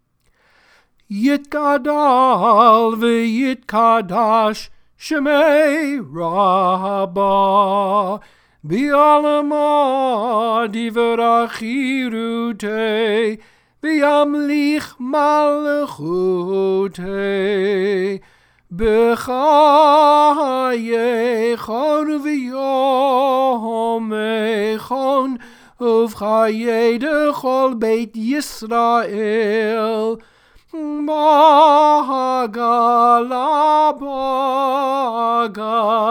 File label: Hatzi Kaddish traditional